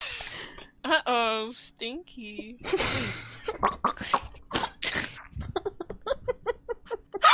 uh oh stinky choking

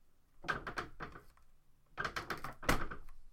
creaky desk

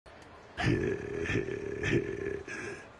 grunt heh heh heh laugh; mass effect 3

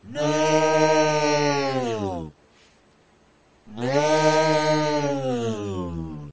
yelling creature

